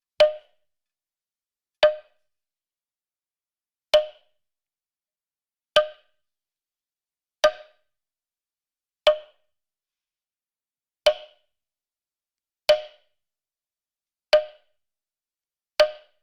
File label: strike hollow wood